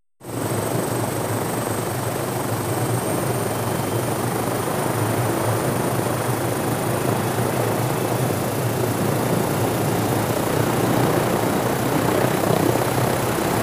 Helicopter flies away

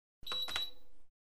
Keyboard press, input error beep

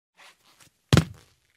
Jump on the wooden floor with shoes; steps

